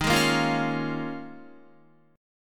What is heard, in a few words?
Eb 9th Suspended 4th Eb, Guitar; strummed; chord